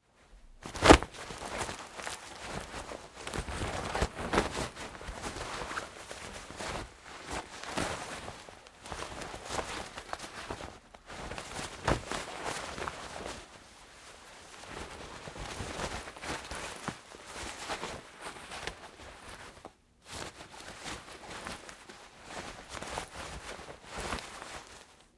Foley Fight Moves Nylon Shorts Struggling; Wrestling And Struggling

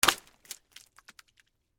Water Balloon Drop, No Break; Balloons; Cartoons; BlastWave

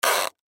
Packaging Tape; Tape Dispenser Tape Box Short Piece; Packaging Tape Dispenser Spring Movement; INDUSTRY; Blastwave

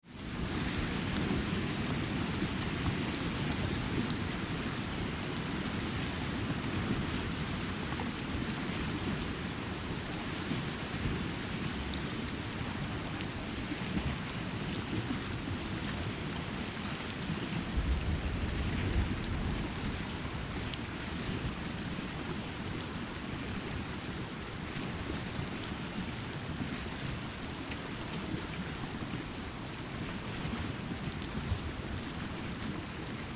Ocean Sea Semi Frozen with Ice Pack Moves; Water moves between ice sheets

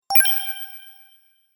Magic Idea; Magic Sound Effects

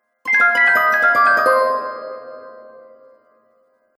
Harp And Chimes Falling Gliss; Musical

